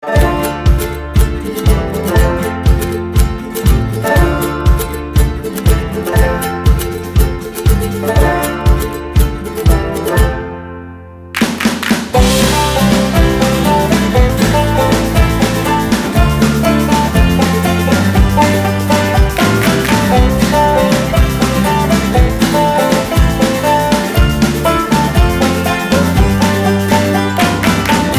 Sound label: (INSTRUMENTAL)